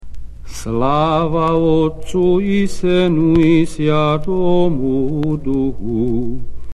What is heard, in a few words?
Tone 5 Stich Melody; PappIrmologion; Podoben Radujsja Zivonosnyj Kreste; prostopinije